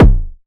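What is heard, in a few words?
kick, ARP 2600 Drums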